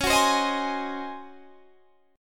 C sharp Major 7th Suspended 2nd Suspended 4th Guitar Standard; C#M7sus2sus4 strummed; 21,21,22,20,21,20; JGuitar